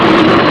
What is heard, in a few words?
Game, 游戏音效; 特酷声音素材库